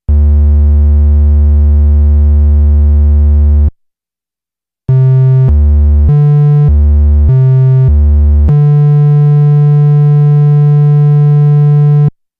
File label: Nord Lead 2 Raw Triangle Wave; Nord Demos; Nord Lead 2 versus 3